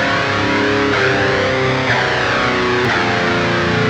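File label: Guitar (metal); Sonic Foundry (Sony Creative Software); Ugly Remnants VOL-2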